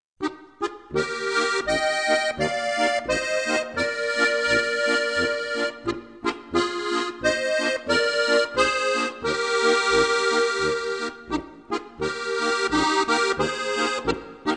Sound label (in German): hochkreuther marsch